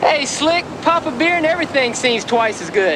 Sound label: pop a beer; hey slick